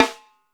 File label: tight, snares